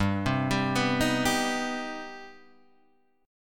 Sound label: C Minor Major 7th G